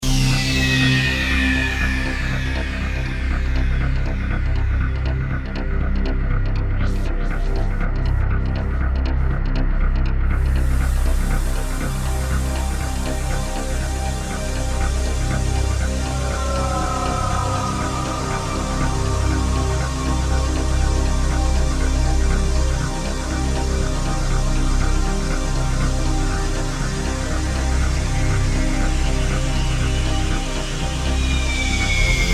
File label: Ominous Pursuit